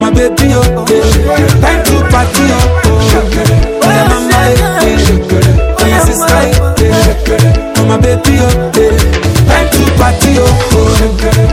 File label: new african ringtone